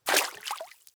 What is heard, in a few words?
splashes; Undertaker's 2017 gamerip PC